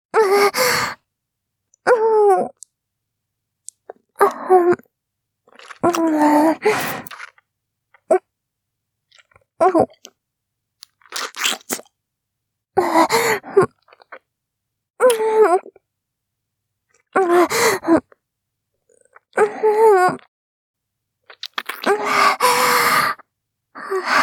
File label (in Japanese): はむはむ, ASMR; キス